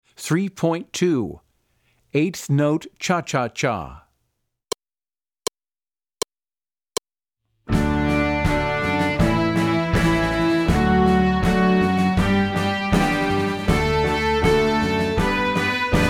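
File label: Eighth Note Cha Cha Cha, String Orchestra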